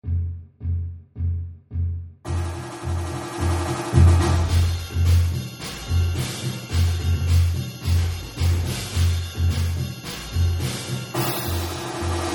the rhythm train, drums

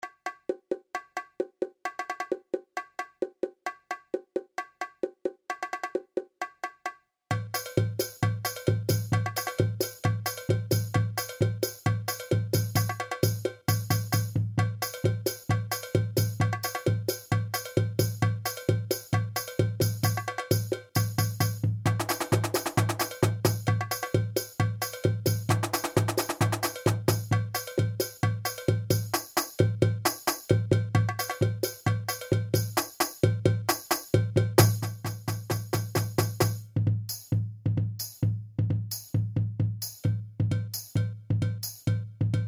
c swing, drums

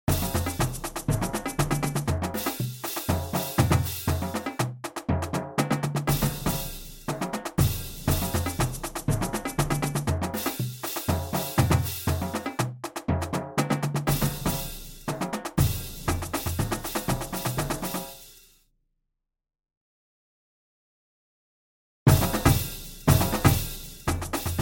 disco train, drums

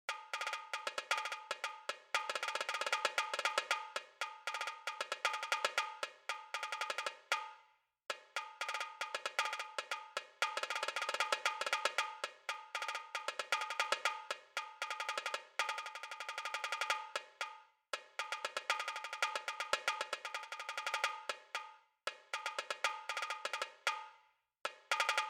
marching rudiments

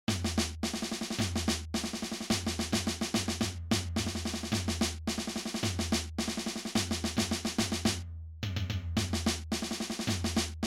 Drums On Parade

